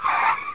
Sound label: landing; Flying Nightmares Demo; Flight Sims